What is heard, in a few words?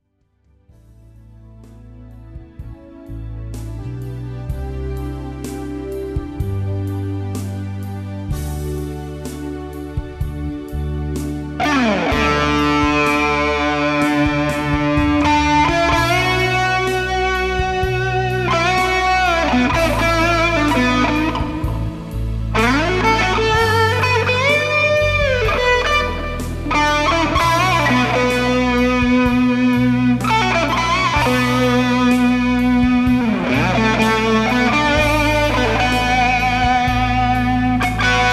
Fat Switch Mid gain CE2